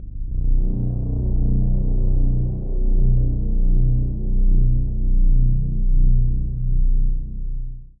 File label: Steampipe Darkpad G 1